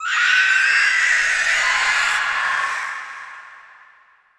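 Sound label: screech, nazgul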